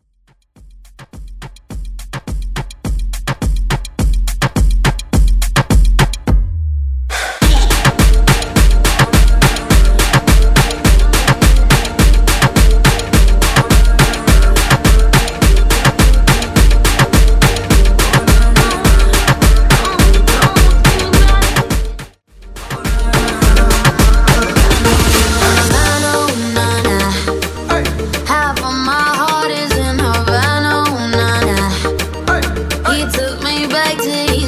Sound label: Twerkahton Edit